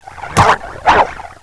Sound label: pit drone melee attack; svencoop